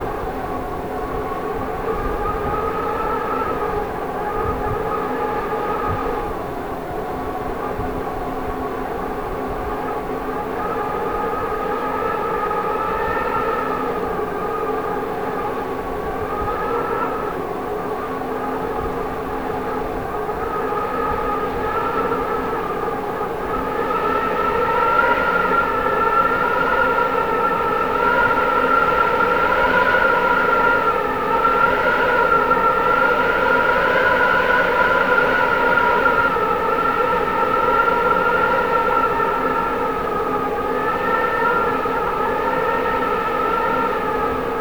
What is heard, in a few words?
storm; best service Blue Box